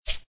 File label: dialog Close; pppoker